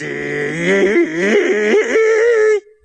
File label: eee, Voice; ooh ahh